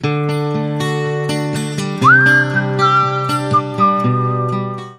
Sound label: Whistle, Sting